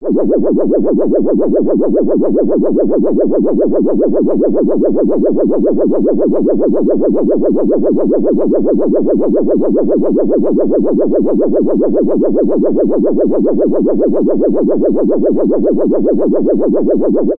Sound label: science fiction alarm siren wobble